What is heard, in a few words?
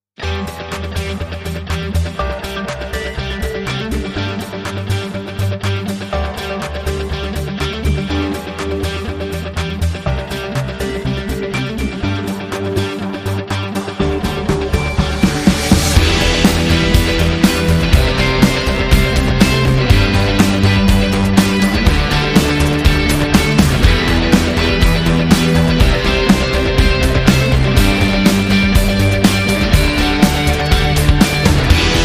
No Lead Guitar